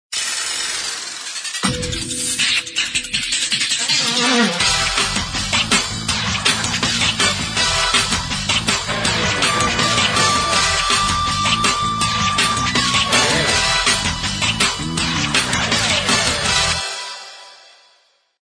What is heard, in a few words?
Car Racer, Cheerful; Samsung SCH-i539